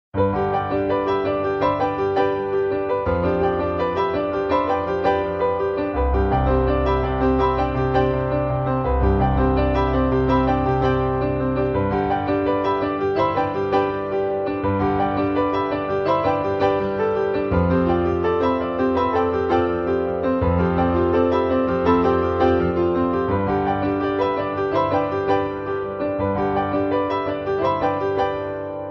Iphone 5 Piano Ringtone; Apple

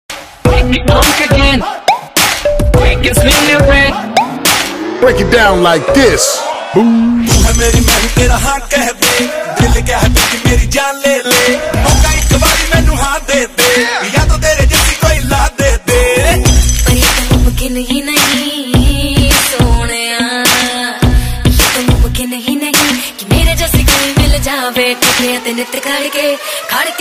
ringtone; jabariya jodi

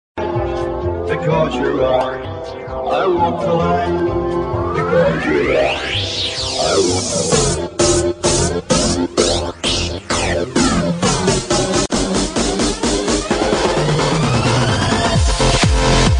ringtone

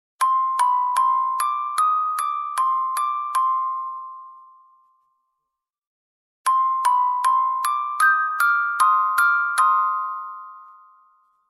ringtone xylophone long sms

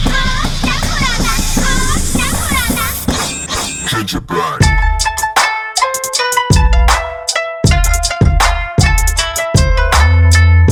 ringtone

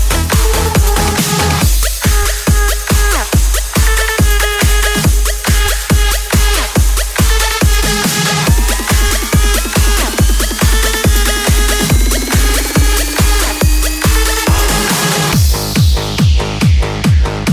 samsung ringtones

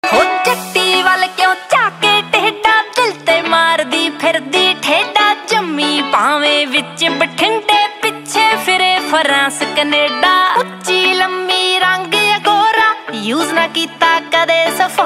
ringtone, new punjabi

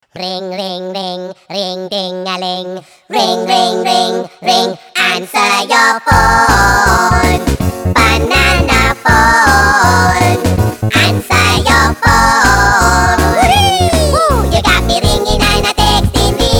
ring ring ring; minions

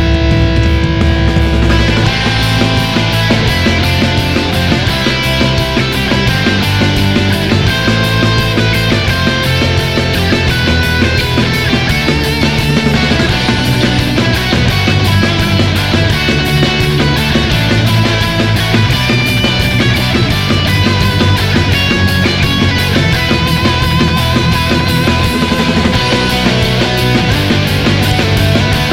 guitar ringtone; metal gear solid

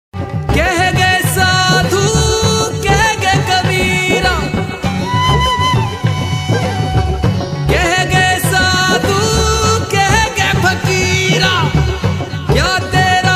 bollywood ringtone

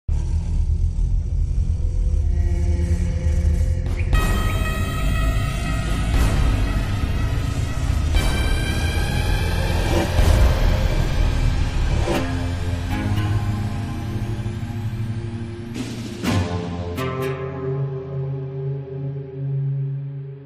telugu movie ringtones; rrr

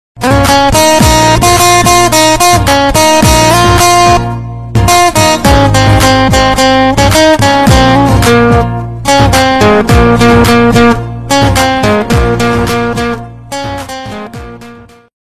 guitar beats status

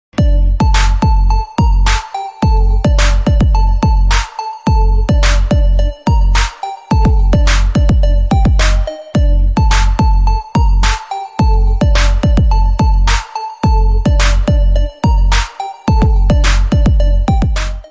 new ringtone

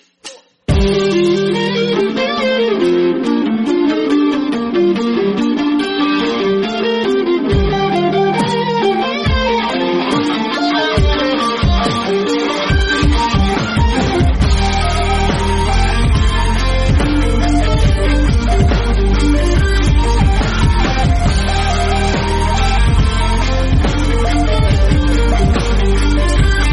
dual bass guitar ringtone

